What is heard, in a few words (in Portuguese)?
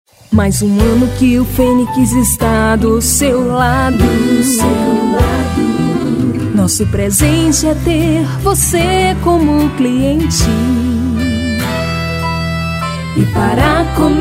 jingle supermercado aniversario; fenix